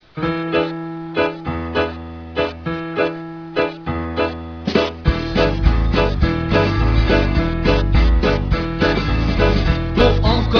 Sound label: rock